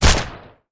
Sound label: shoot pistol; Epic Toon FX